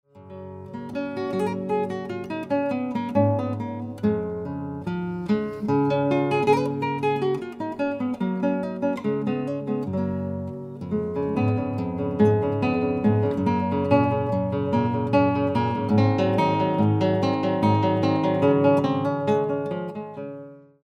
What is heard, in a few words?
MINUET